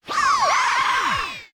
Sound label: spiderboss parried